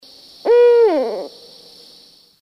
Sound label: Whoo; owl; Herman Creek, Mark O Hatfiled Wilderness Area; The second owl made a different call; at least two owls in the trees; The second owl made a different call than the first